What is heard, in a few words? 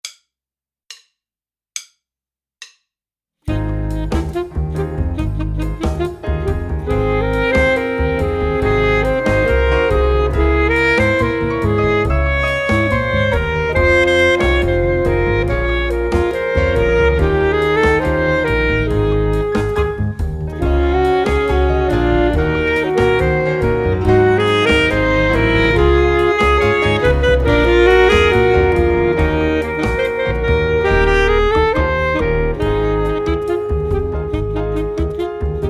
Full Sax